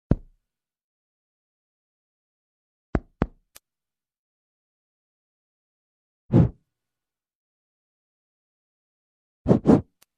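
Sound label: microphone hits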